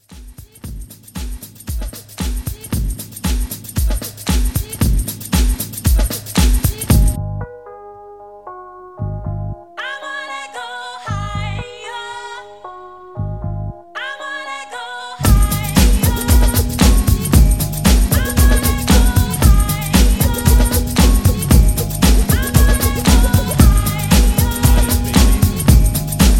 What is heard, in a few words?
90s Old School Re Drum